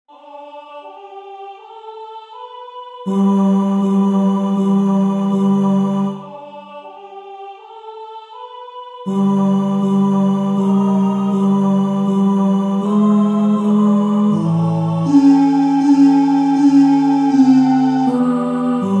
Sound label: Tenor